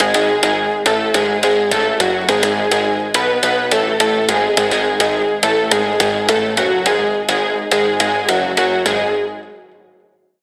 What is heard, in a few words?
Pluck; Routed Sound; Spire Expansion - Noble Gangster Vol.1